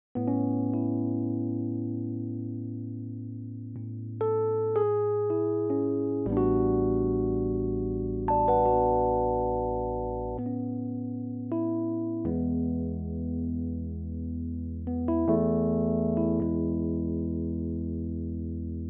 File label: rhodes